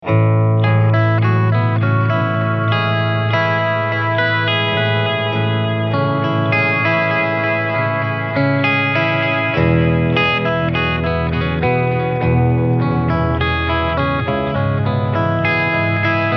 Clean Octaver Delay

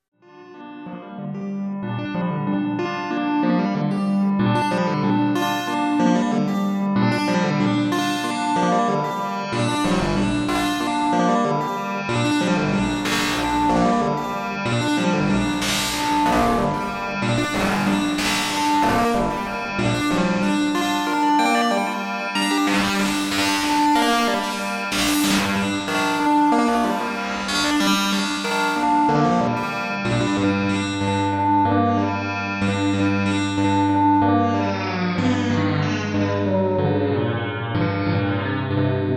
spectraphon jam; Make Noise

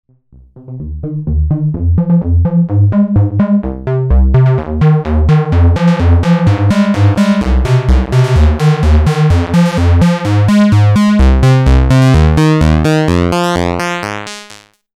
waldorf rocket pw open cutoff

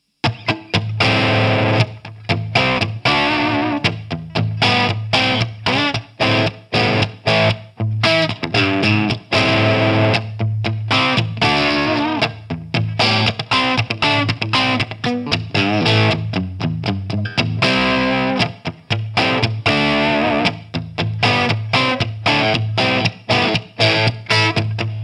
Gretsch Streamliner Crunch

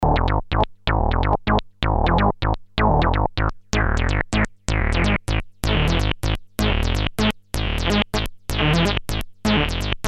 Sonicsmith sq a155

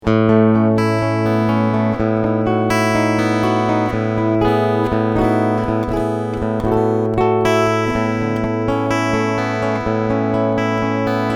Schecter C 1 EA Classic SVPB Piezo Picking

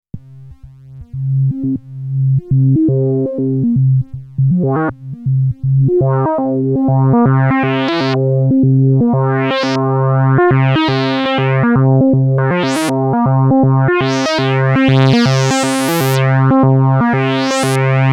analog bass; MFB Dominion Club

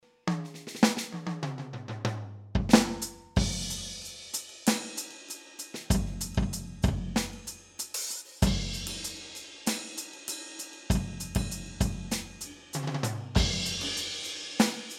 Alesis Crimson II Kit Jazz

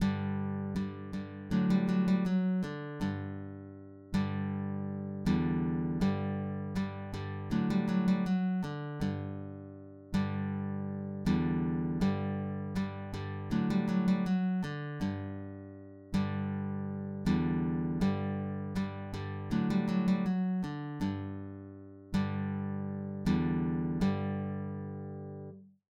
Spicey Guitar

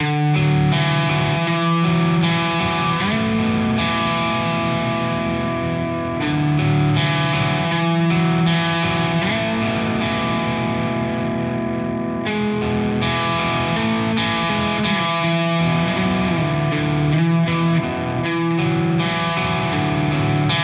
Single Coil Mode; Walrus Audio Ages

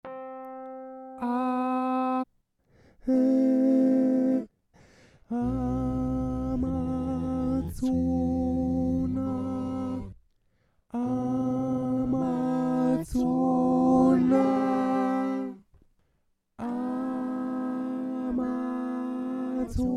Reverse synchro

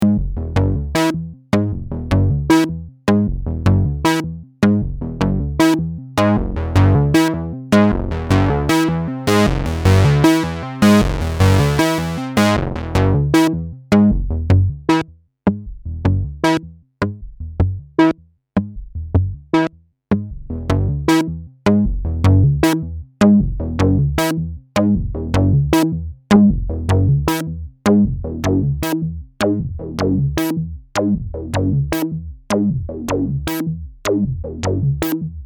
Filter 12dB; system700

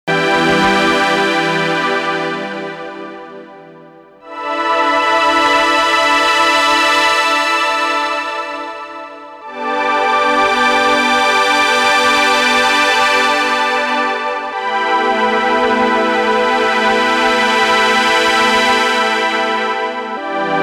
SE50 Super Chorus Strings; Trevor Horn

